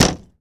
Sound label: Plane P40 Hit Bang Canopy; Airplanes